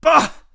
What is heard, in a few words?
hit; Escape from Tarkov; usec2